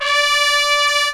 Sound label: POP BRASS